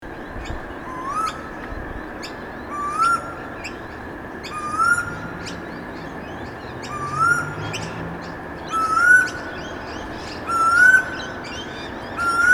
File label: late afternoon suburban ambience loud storm bird singing